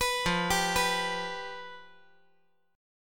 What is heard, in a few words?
F Suspended 2nd Flat 5th